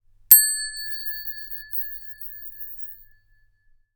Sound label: ping